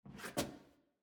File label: Footstep Hallway Rotate